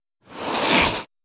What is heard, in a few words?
Ocelot Hiss. Medium Pitched Hiss.